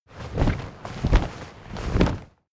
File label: flag large flap